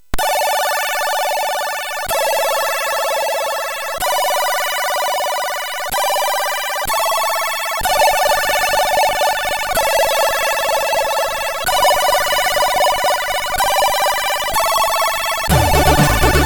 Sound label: Game tune, MOS6581R3; Doussis Stello; hvsc